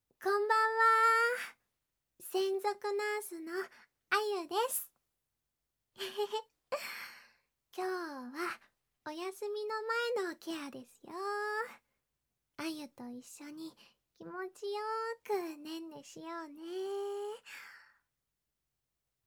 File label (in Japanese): 耳舐めとオナサポとお掃除（左右反転）; あゆみママ; ナースあゆみ; 癒し系専属ナースのゆいちゃんと菜々子ママに甘々&バブみで包まれちゃうバイノーラル